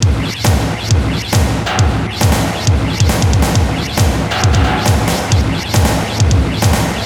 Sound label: Dark Industrial Loop 136bpm